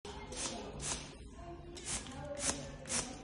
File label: water spray